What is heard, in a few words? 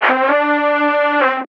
vocal tape, Gm; SD AURORA Sample Pack; Slate